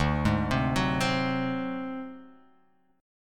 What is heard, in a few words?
D 7th Flat 5th D down, chord; D7b5 arpeggiated; JGuitar